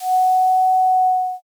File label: flute